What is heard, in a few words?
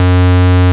ARP WAVE; akai; mpc1000; factorypresetsamples